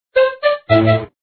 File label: spin sound; aztec gold